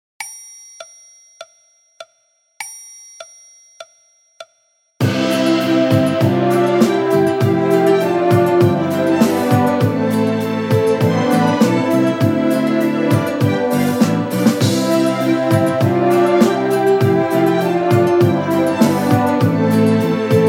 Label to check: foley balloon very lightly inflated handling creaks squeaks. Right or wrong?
Wrong